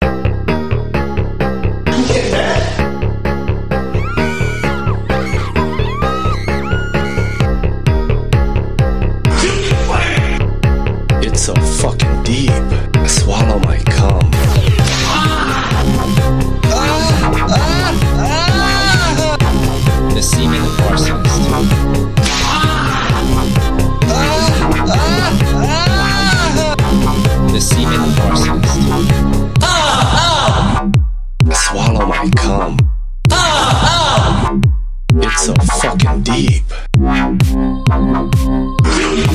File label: beat